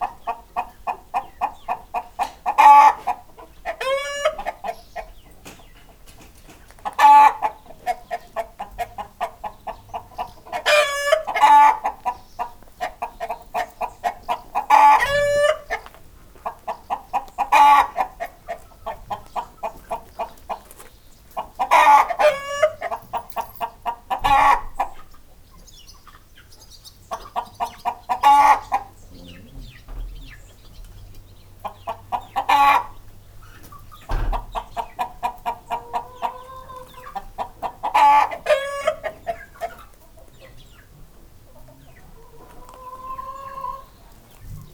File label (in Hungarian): páros kotkodá, magyar tyúk; parasztudvar